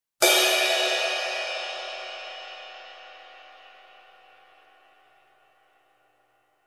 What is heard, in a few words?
Hard bright drum ride hit